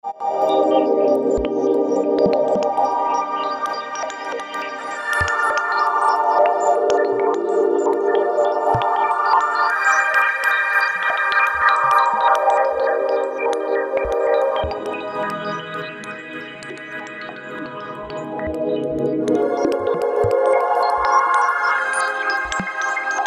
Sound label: Swirly Q, Pads; Green Oak Crystal